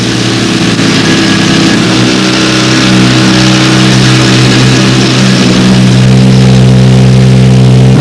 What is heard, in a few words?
drill